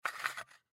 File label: Packaging Tape; Tape Dispenser Roll Tape Area Without Tape; Industry; Blastwave